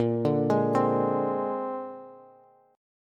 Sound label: A sharp 9th A sharp Bass; arpeggiated; JGuitar